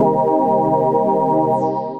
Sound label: Minor 7 Deep, Synth Lead